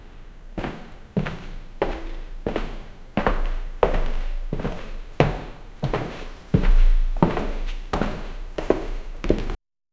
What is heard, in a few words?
slow foot steps walking a, creaks